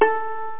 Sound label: pluck, net instrument; Internet 1996 World Exposition; Brain Opera; net-music